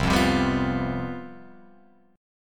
C Major 7th Suspended 4th C down Guitar Open F; strummed

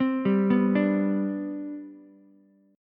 C Major 7th Suspended 2nd C down Ukulele, chord; CM7sus2 arpeggiated; JGuitar

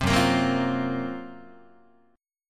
F sharp Major 7th Flat 5th, down, Guitar; F#M7b5 Chord; strummed

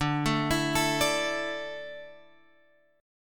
D Suspended 2nd D down Guitar Open F, chord; arpeggiated

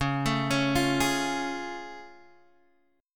A Augmented Major 7th C sharp

